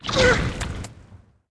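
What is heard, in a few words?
hexscyther damage up, monster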